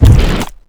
thumper step; lethalcompany; zerosiki; slender

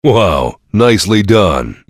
soldier positive vocalization

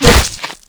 thumper hit sound; lethalcompany; zerosiki; slender

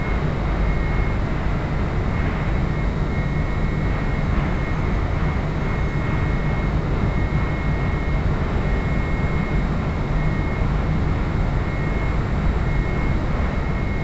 music; wicked; slender

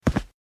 alert; misscircle

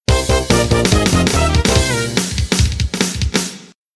brassy, music minigame; tf2ware ultimate

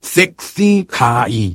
engineer pain severe

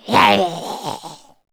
kid zombie idle; nmrih; childrenofthedead; zerosiki; slender

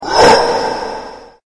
attack; slender; team killer

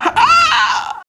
female zombie pain; nmrih; zerosiki; slender

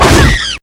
crit hit; slender

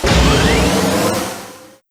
kart boost; tf2ware ultimate; mariokart

